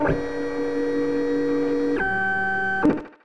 scan effect; Tron Legacy

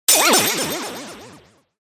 Irate; miitopia

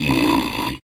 Rise; Peace, Death; Fatecard

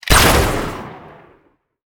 Blizzard; blockade classic

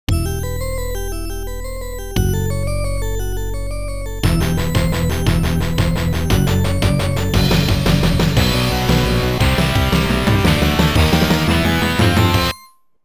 COUNT DOWN

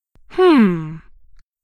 Voices Hmm; freaky clown town mystery windows 2020

